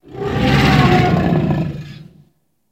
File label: Dinosaur Roar Deep